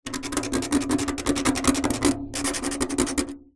foley metal spike small scratch rusty metal trailer panel; zapsplat